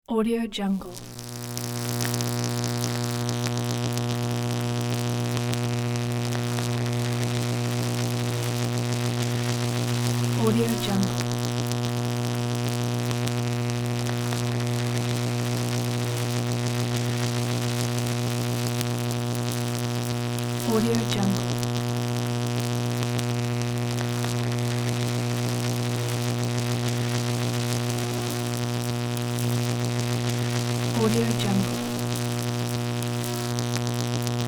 electricity

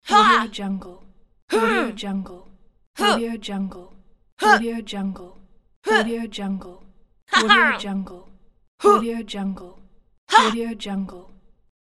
woman warrior hit scream

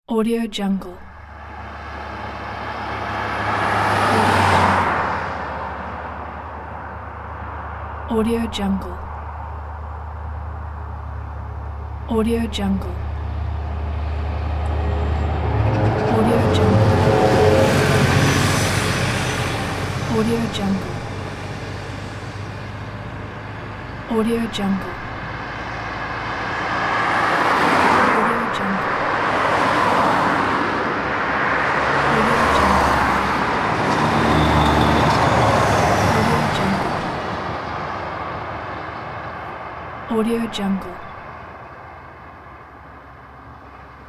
city cars

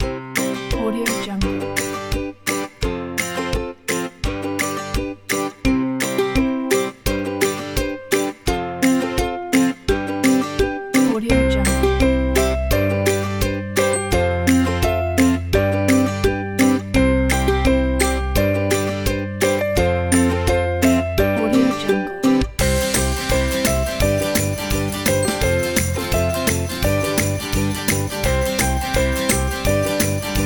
happy children

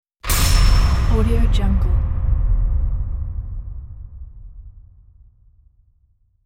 heavy metal lock impact

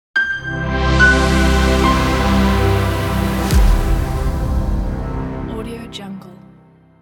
dreamy elegant logo

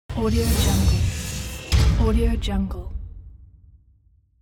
transforming robot

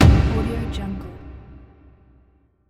trailer impact

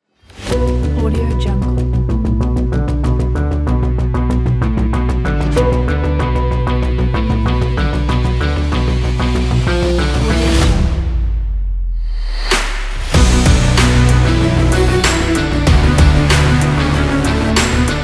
sport epic rock

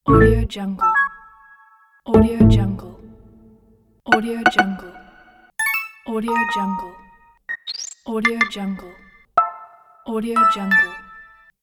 interface sounds

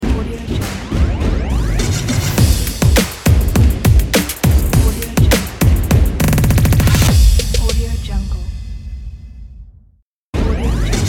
sports intro logo

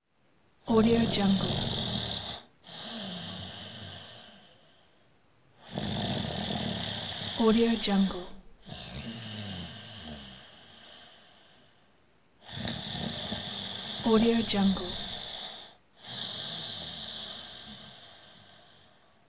snoring

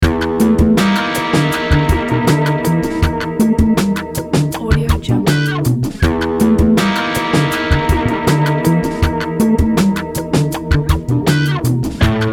scary funky groove

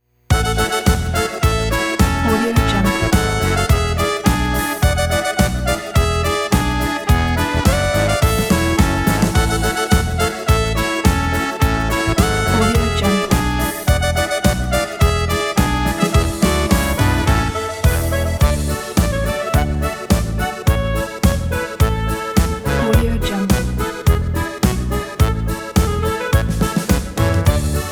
bavarian beer fest

